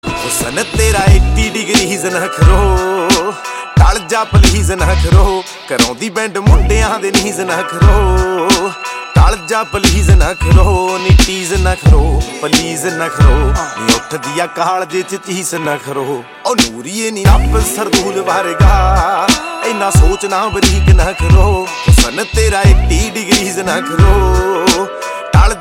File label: Punjabi